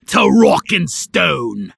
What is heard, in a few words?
Bar Cheering when drinking